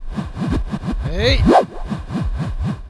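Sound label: Mob attack; Bossmob; 금전방; 황금제강귀; 2단계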